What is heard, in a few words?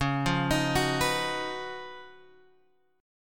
C sharp 11th C sharp; C#11 Chord {9 8 X 8 7 7} arpeggiated